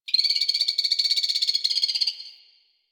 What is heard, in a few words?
Beach Night Poison Frog; Ambience; Warframe; Grineer Beach